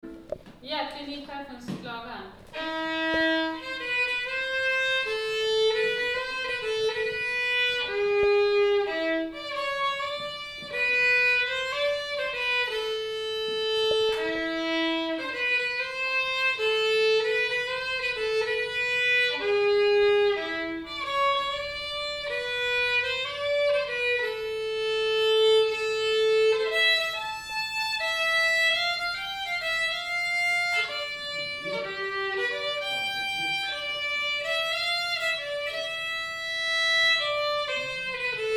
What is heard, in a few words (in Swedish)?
Polska; Puittas; Klinikchefens klagan